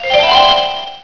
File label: new game; BabyLabyrinth 1.0.4; Maze